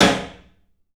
CLUB SNARE; 3D Sound; 3D Drums

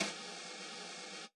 brush swirl w attack, Yamaha DJX Rock Kit; 144 Rock Kit

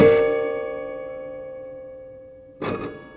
Prepared Piano; Battery 3 Sample Kits

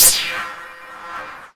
Cwejman S1 - S1 Hat; S1 Sorcer Hat; DevSnd Cwejman S1 - RD

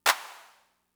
Clap; Fricke MFB-522

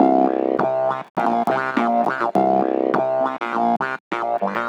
Clav Lead; Leads And Riffs; Sonic Foundry (Sony Creative Software); Synchro Funk

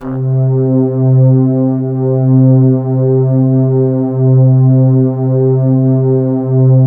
pad, C3; USB Soundscan vol.05 - Explosive Jungle; AKAI

